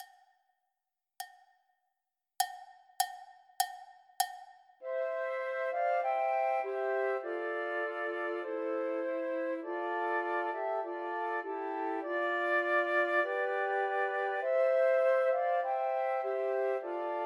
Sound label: Trios for Three Flutes; In the Bleak Midwinter; Christmas